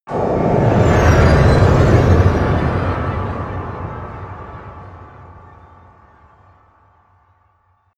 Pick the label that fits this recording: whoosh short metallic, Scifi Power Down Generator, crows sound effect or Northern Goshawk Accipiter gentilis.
whoosh short metallic